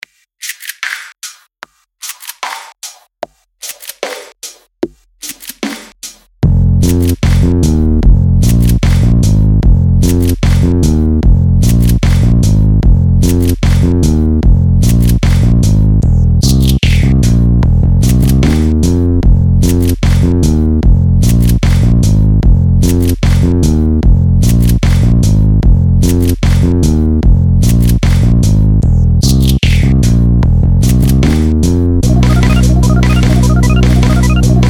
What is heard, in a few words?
industrial accident